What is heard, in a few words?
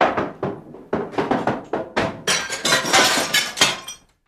Falling Dwn Stairs, crashes